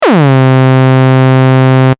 adsr

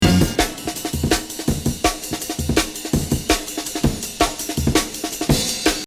Amen 165 RePitch

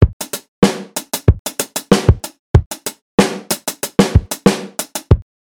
drums

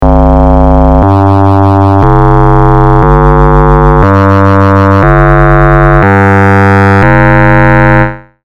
sine

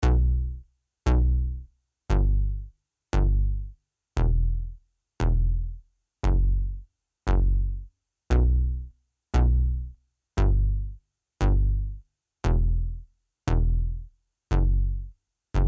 Bass

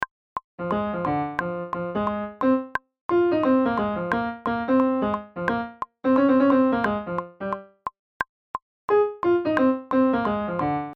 sax

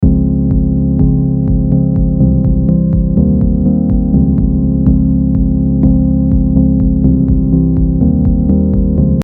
chorus

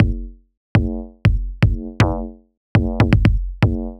kick

